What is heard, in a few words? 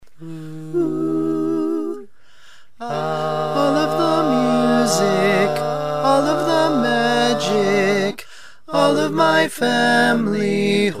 barbershop tags, all parts